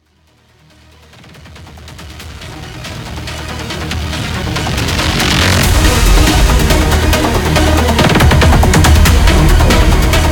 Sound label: Pre Shot Music Ramp; Voidbreaker; ps5 windows xbox series xs; Voidbreaker Pre Idirs Shot Music Ramp V2